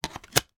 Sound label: household cd put in case; sound effects one